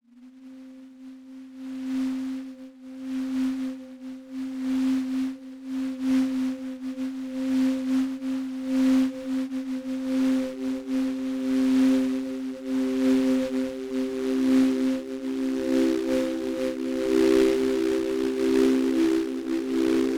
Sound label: stems